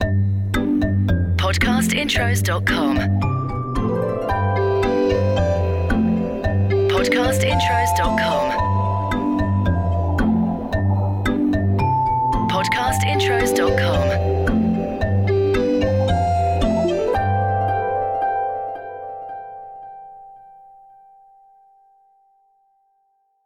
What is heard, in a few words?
CHILDREN; Podcast Watermark